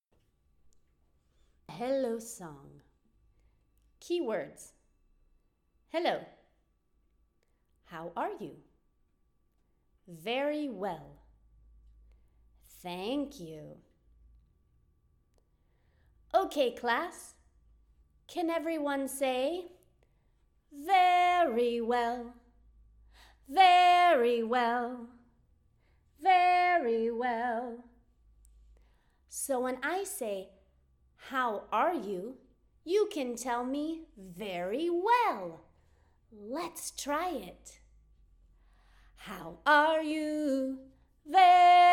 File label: Rituals Hello Song English